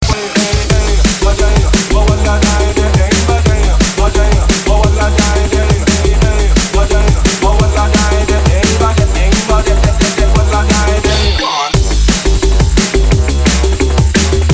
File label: Ragga